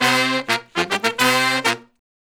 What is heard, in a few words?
Horn Section